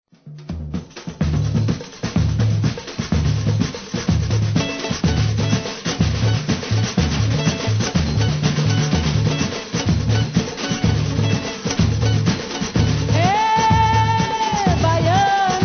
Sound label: vocal, chanteuses